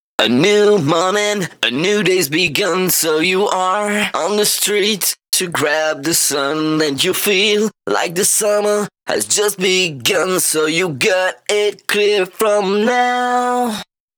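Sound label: Pitched Vocals Verse 1 DRY, 128 BPM; Vengeance Samples; VTDS2 Song Kits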